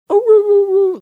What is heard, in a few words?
mimicking howling